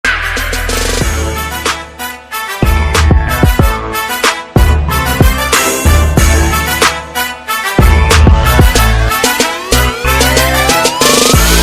music remix sonnerie telephone; one piece

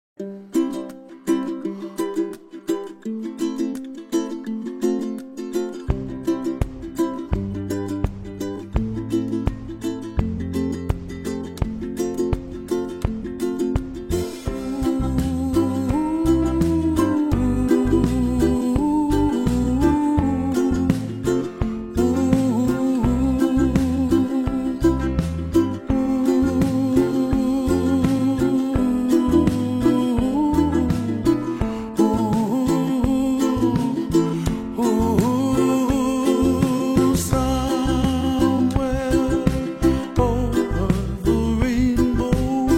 Reggae remix

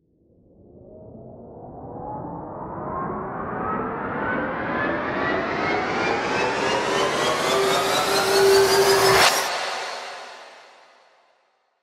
Riser Tension build Sound; Riser Tension build Sound Effect

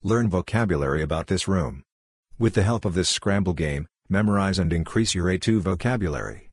HOUSE

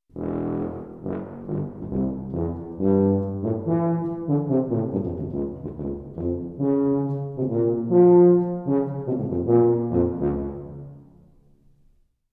Tuba

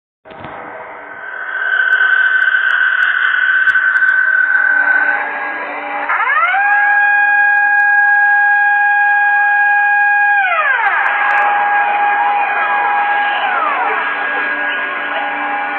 siren raid

